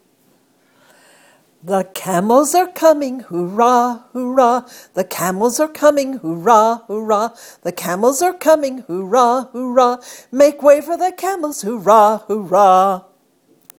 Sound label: Camel Song